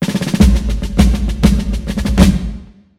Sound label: Sting; Wanted Army